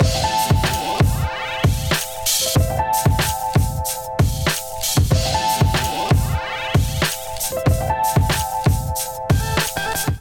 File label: Kit Melodic 94bpm, Drums; MIDI Clips; Bang Ya Head; Ableton; Chop and Swing